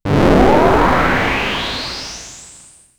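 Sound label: a small explosion followed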